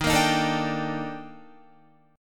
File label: Eb Major Sharp 11th Eb Guitar; EbM#11; strummed; 11,13,13,14,14,14; JGuitar